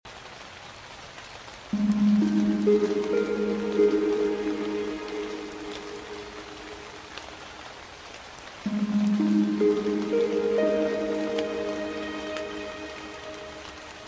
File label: Celtic Forest Walk in the Rain with 432Hz Celtic Harp Music; Calming; Loopable